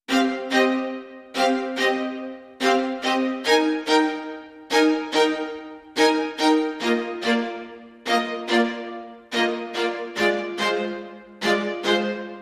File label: String